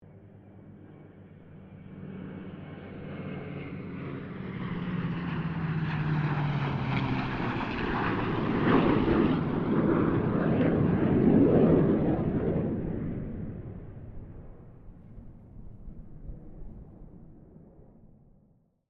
AIRCRAFT SABRE METERO MUSTANG KITTYHAWK HORNET PASS; Mixed aircraft squadrons; Airplanes (Sound Effects:Vehicles)